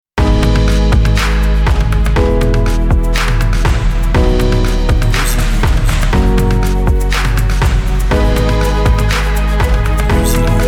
inspiring trailer, jingles

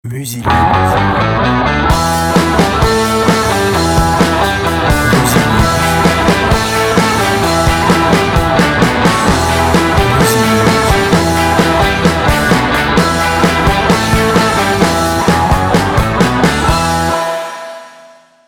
powerful rock

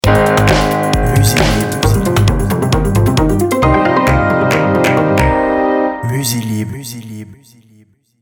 explain, jingles

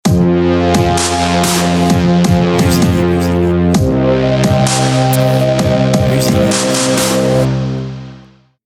car step jingle

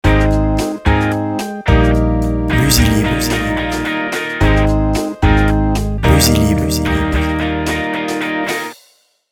happy jingle

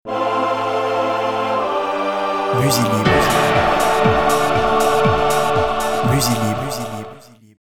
foot, jingles

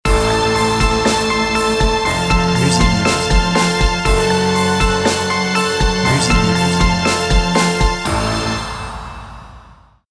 halloween jingle

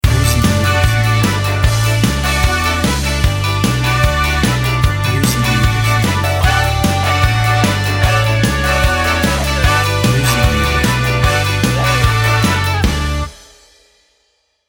ska punk jingle

